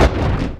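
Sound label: percussion FX, Dance Drums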